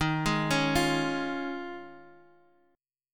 D sharp 7th Suspended 2nd Suspended 4th D sharp, Guitar, Half Step Down